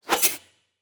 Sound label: shadow assassin strike; Shelter